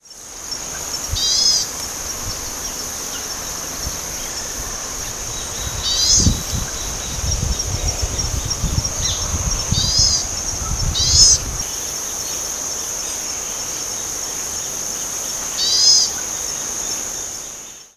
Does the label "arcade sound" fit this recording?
No